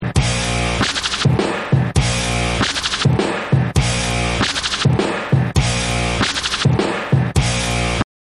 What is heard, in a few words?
Scratch